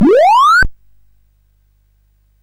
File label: sid CSG8580R5